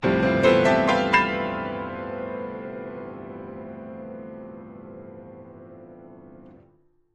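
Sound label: Grand Piano Ascending Chords; keyboards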